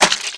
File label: flesh, footsteps infected run